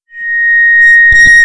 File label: feedback, audio feedback